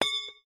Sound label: Metal Drop; MiSide